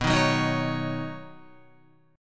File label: B Major Add 9th, Badd9 chord, Guitar; strummed; JGuitar